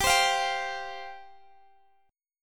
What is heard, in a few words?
G 7th Suspended 4th G down Guitar Drop D